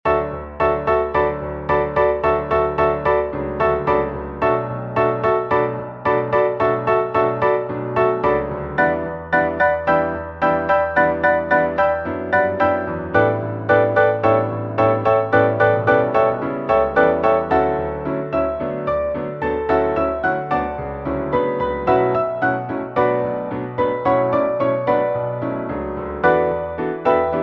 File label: piano duet